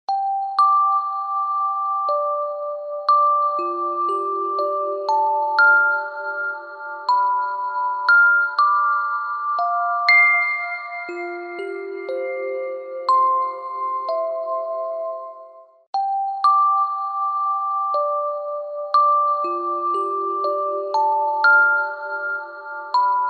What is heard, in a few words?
Restoration; GC900 Viewty Smart